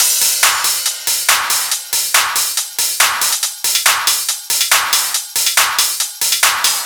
drum Loop 140BPM Full

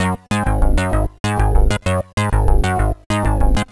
moog discovery F# 129, synth loop; Zero-G; Soundclash; Nu Dance Electro

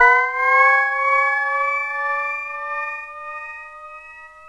SWEEP

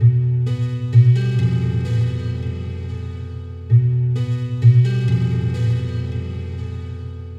Distorted Piano; Drums & Drones

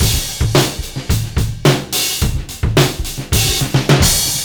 Beat Loops; Zero-G; Monster Beats

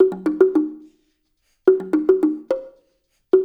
percussion loop; InVision Interactive; Percussion Slam; RULE WORLD

